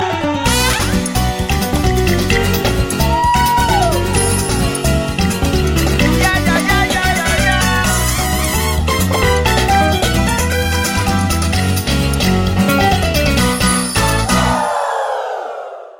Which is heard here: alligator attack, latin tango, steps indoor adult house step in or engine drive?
latin tango